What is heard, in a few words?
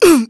piglet hit; Bad Piggies